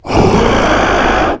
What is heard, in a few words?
ghost roar